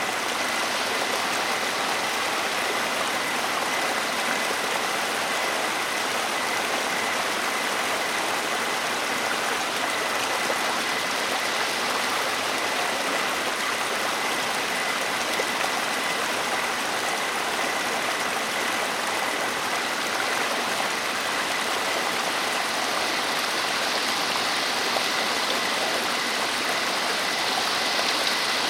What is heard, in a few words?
Meadow headphones